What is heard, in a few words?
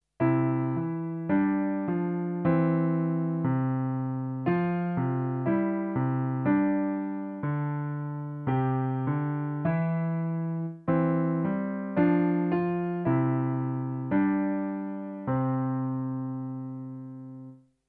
Love Song, piano